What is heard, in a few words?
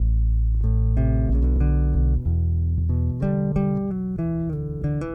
bass, analog funk